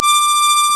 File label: Harmonic; Instrmnt; Sound Library 2000; Wayzata Technology